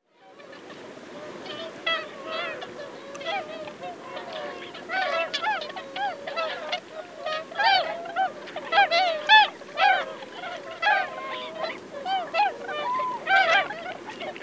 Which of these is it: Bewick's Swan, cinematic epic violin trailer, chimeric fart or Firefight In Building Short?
Bewick's Swan